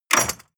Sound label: medieval click light neutral